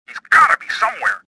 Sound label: escaping; worker2